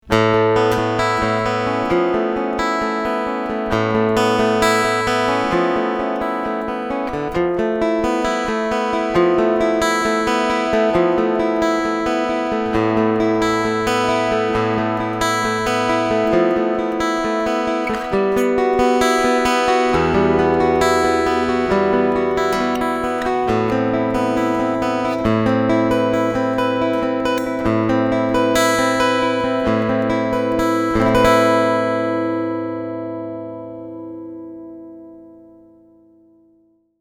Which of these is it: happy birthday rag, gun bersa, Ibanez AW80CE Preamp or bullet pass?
Ibanez AW80CE Preamp